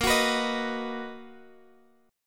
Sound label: B Major 7th A sharp